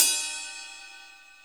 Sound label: GM SNARES; Sonic Reality; Interactive Drum Kits